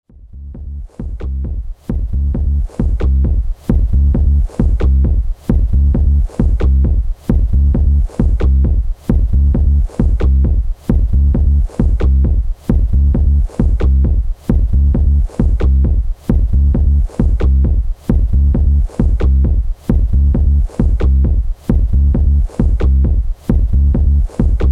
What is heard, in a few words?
Loop